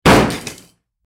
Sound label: car To Car Crash; sfx; teststrecke game